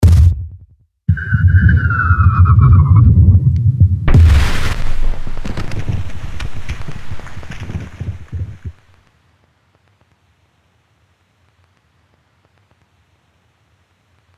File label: fireworks